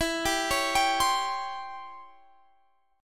Db Minor Major 7th Flat 5th E

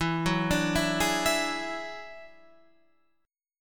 F sharp 7th Suspended 2nd Sharp 5th, E

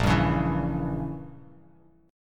A sharp Diminished 7th, Bass Standard; strummed; JGuitar

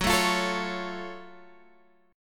Gb Minor Major 7th, Guitar, Standard; strummed; x,9,7,10,10,9; JGuitar

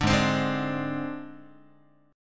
G sharp Major Flat 5th, Guitar

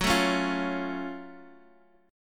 Gb Minor Major 7th Gb down Guitar Half Step Down

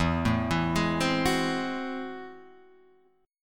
A Minor Major 13th

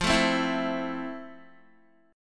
F Major 7th, Guitar; FM7; 12,12,12,9,9,x; 4F,4A,5C,5G,5C,6E; strummed

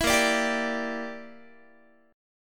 A sharp 6th Flat 5th, Guitar; A#6b5; strummed; 19,18,17,17,18,x

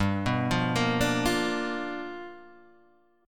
G 7th Sharp 9th G, Guitar DADGAD; down

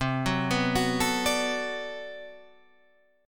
B Minor 7th C, chord

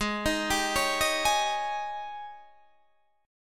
G sharp Major 7th Suspended 4th

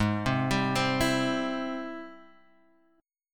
G sharp Augmented Major 7th, Guitar, Drop D; 6,3,5,5,5,x

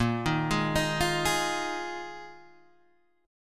A sharp 7th Suspended 4th down Guitar DADGAD

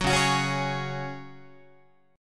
C sharp Suspended 4th C sharp Guitar, chord; strummed; 9,9,9,11,12,12; 4A,4E,5A,5D,5G#,6C#; JGuitar

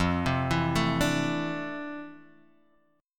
F Major 13th, Guitar, Drop D; 3,3,2,2,3,x; down; chord shape

